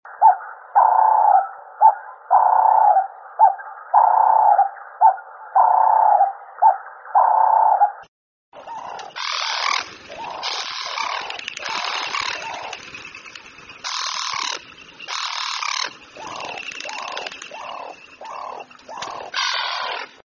tourterelle du cap, chant

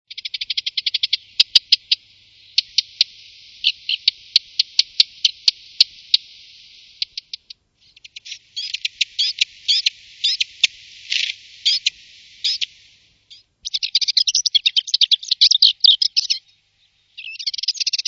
fauvette passerinette, oiseaux chant

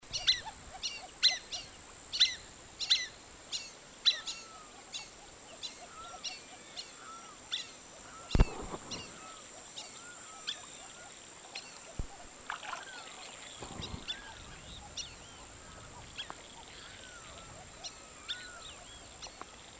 dendrocygne a ventre noir, chant

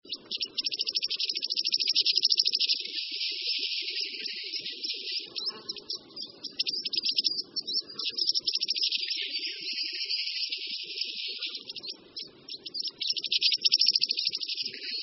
euplecte ignicolore, chant

